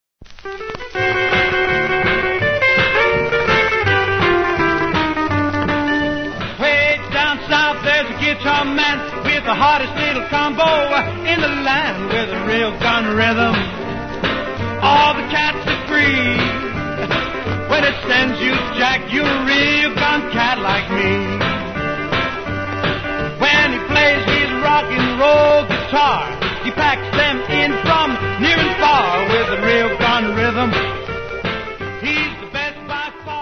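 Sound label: rock and roll guitar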